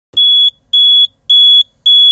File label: beep